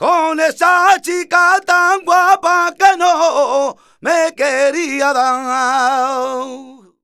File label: voc c, Flamenco Vocals Male Cantaor